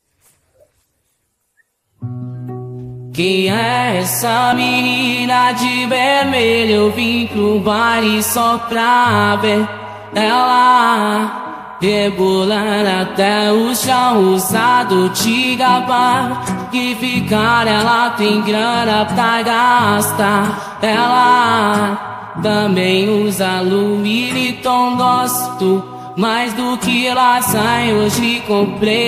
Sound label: medley acustica